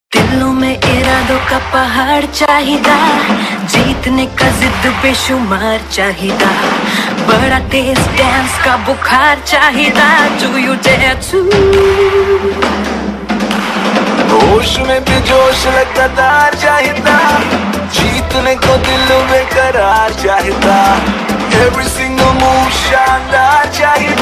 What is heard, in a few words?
Title Song (Female); TV Serial Tone; Life OK; Dare 2 Dance